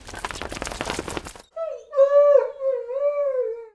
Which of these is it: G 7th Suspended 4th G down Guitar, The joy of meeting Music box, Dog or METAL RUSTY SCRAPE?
Dog